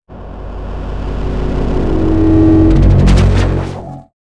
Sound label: Motion Hum Morph Elem